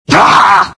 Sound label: die